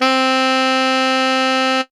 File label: c saxophone